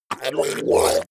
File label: zombie grunt choke male drown